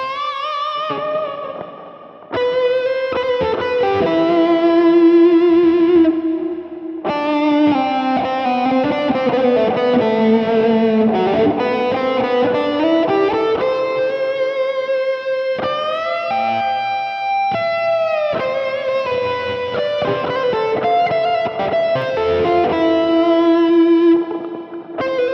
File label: stems Wet